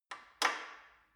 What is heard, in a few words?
gym bicycle saddle unlock soft